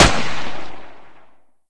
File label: rifle loop, 9x19mm; Caliber